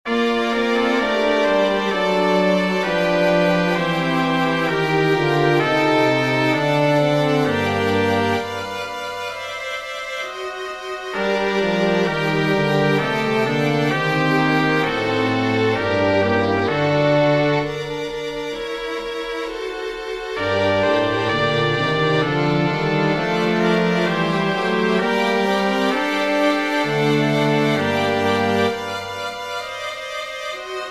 chorale for brass strings; bach johann sebastian; nun ich weia wirst mir stillen

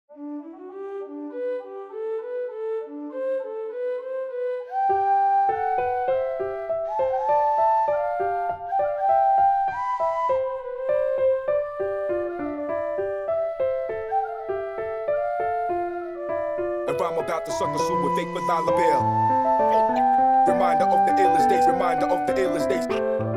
fugue

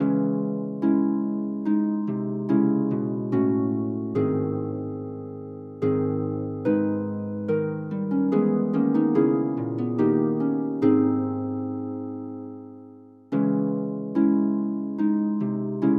for harp; Johann Sebastian Bach; lobet gott unsern herrn

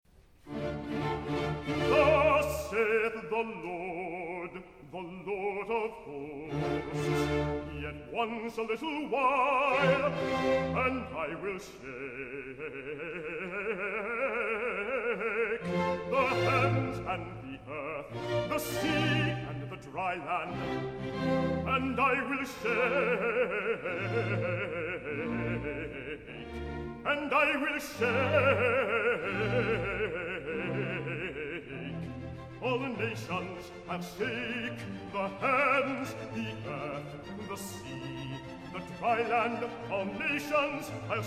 concert choir recitative for bass; thus saith the lord

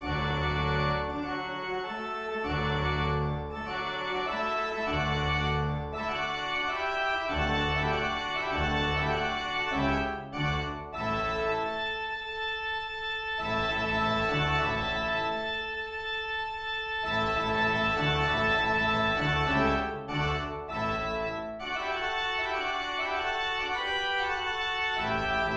organ fanfare; marsh john

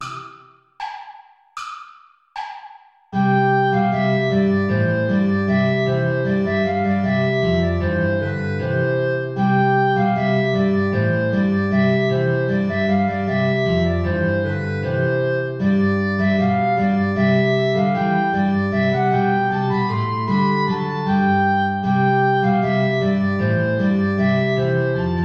play along without guitar; deck the halls; traditional

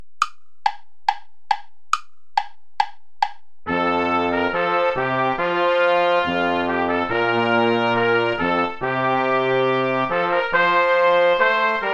ballet play along without trumpet; praetorius michael

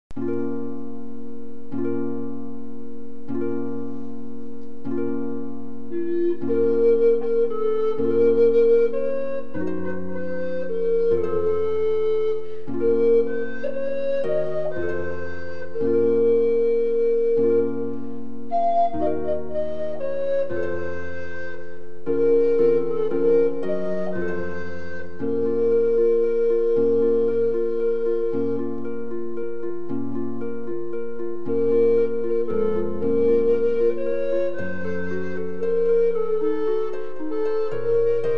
for flute harp; coventry carol

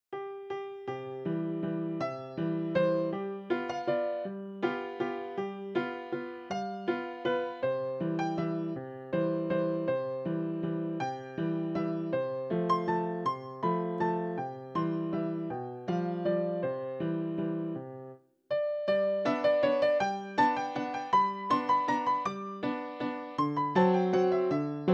christmas chimes

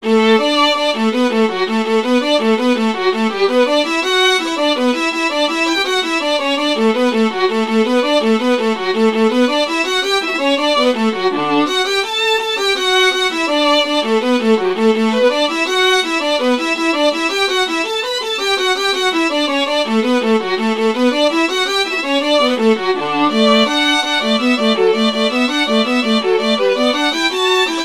for violin viola; the merry blacksmith

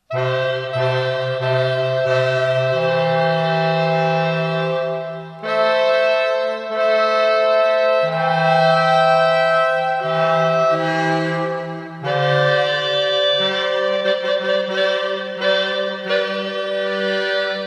fanfare for clarinet quartet; 1812 overture; tchaikovsky piotr il

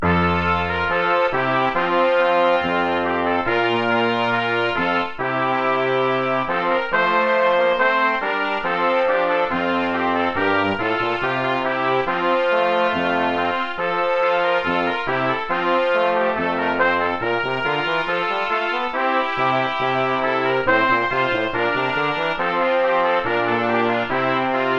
ballet; praetorius michael

